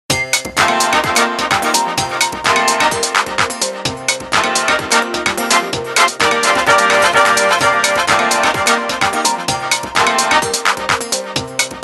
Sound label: Ring Confetti